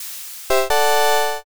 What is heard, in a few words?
challenger